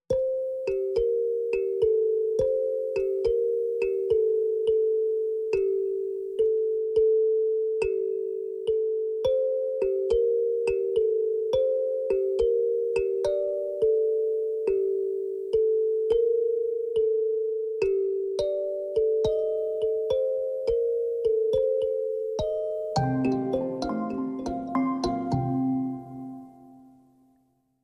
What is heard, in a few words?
Pan Drums Only